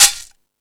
Percussion, E-MU Pro-Cussion

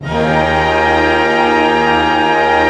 PENTA D# FF, orch penta; Sound On Sound - Peter Siedleczek's Orchestra

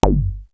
bounce, Yamaha CS-5

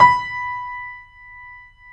JAZZ, Keyboards Acoustic; AKAI; USB Soundscan vol.17

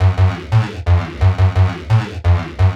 Stab Loops; neuro; Optiv & BTK

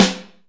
Reverb Kick Snare; PS-37 Dance Synths; Percussion

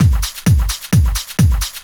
straight, Techno Trance Essentials drum loops

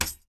metals; Spectrasonics; Liquid Grooves 1 & 2

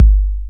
DR550 Kick, Boss DR-550 Drum Machines; 300 Drum Machines

